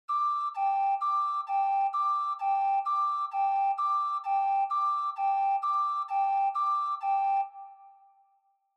Ambulance Siren Fake Reverb High

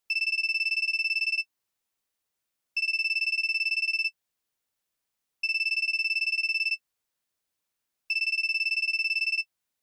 Feature Phone Ringtone Low

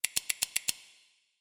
Scissors

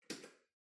Light Switch Wide Panel, Far Hall Mid

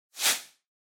Noise Sweep Dry

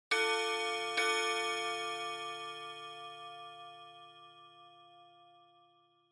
Pendulum Clock Chime Denoise Mid

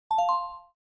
OS Sound Notification

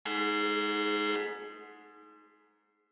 Electromagnetic Buzzer Hall

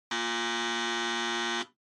Electromagnetic Buzzer, Dry

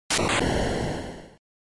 SNES RPG Magic Lightning

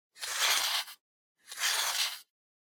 Outlet Timer Dial; コンセントタイマー01-4(ダイアル); タイマー機器

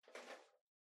Portable Stereo Far Pick Up

